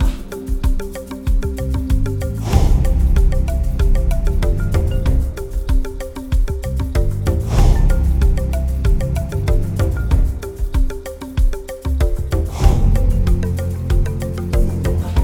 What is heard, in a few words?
music main game night